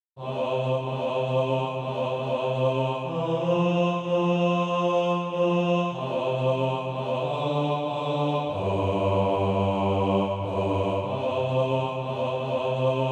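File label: bass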